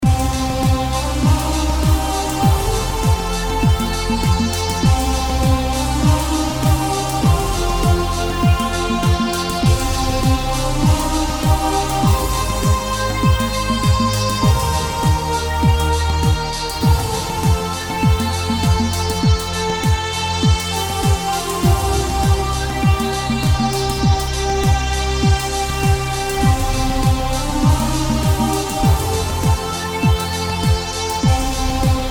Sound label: Urban Sci Fi Heroes, sci fi